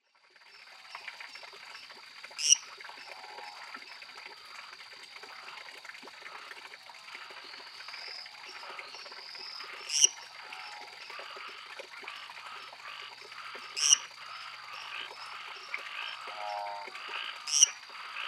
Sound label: Dendropsophus minutus